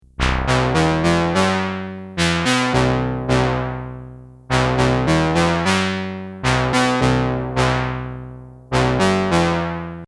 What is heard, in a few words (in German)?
FM Melodie